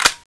charger clipout; cstrike; weapons

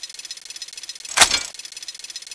bdraw; weapons; thanatos7; cstrike; bb weapons

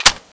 railcanon clipout; cstrike; bb weapons

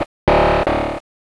hitwall; shok; cstrike; jb engine

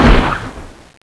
dragonsword stab hit; cstrike; bb weapons

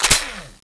boltpull; weapons; cstrike; bb weapons

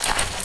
baton deploy; cstrike; jb engine; weapons

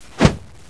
hand slash; cstrike; jb engine; weapons

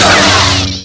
laser hit wall; cstrike; jb engine; days mode; starwars

